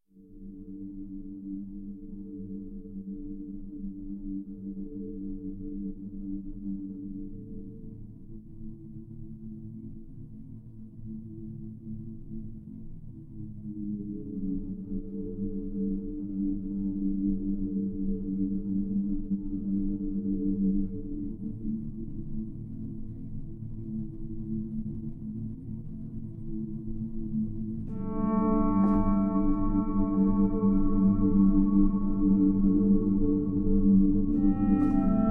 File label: music, nether, crimson forest; minecraft; game